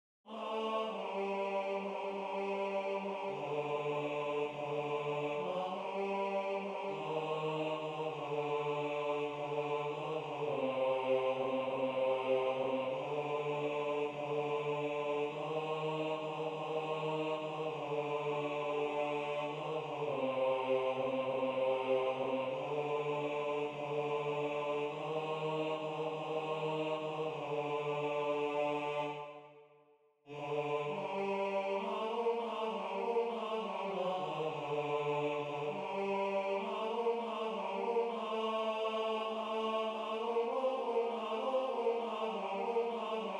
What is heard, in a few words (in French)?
basse